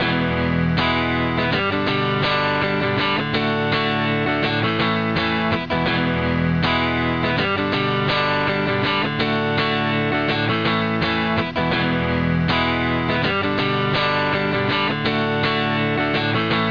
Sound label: micro amp